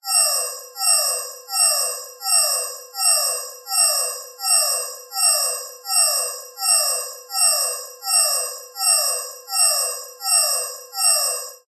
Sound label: science fiction spaceship alarm